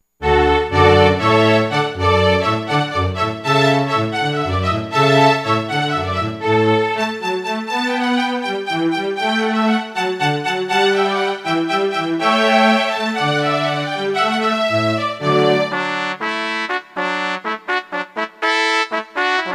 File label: Hornpipe from Water Music; Handel